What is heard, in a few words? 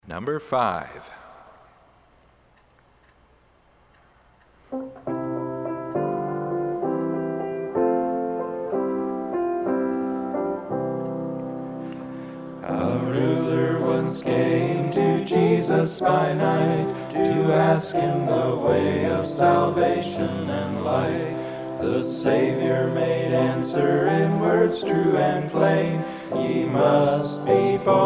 Hymn